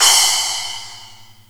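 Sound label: SPLASH, cymbal; 300 Drum Machines; Zoom RT-234; Kit C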